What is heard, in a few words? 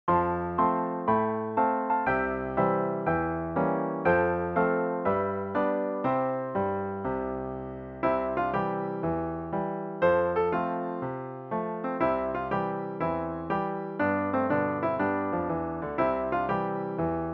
just piano